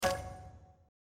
start

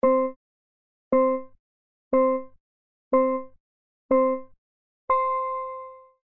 Countdown

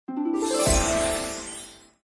Free Game In

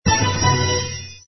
finish win

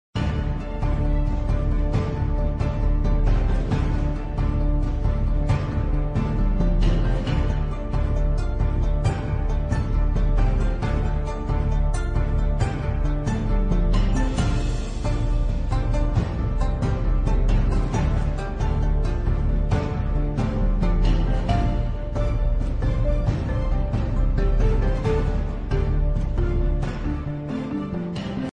BGM

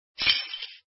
collect

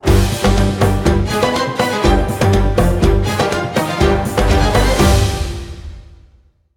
bigwin popup

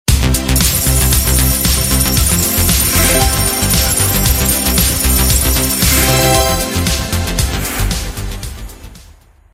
super win

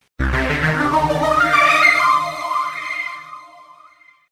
Win

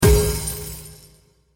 finish win

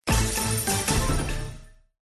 finish win

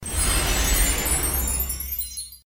roll fire

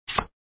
draw; Tongits

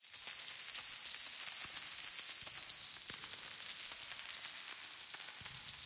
big win coins

